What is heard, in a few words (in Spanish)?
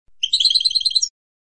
bibleos acascabelado, campero